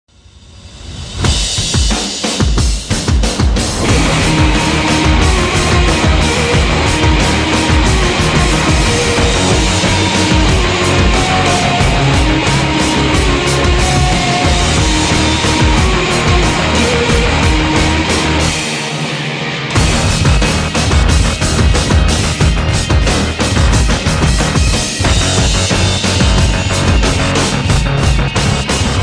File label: music survivor; cstrike; ze4